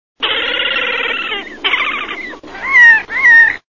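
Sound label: Slavonian grebe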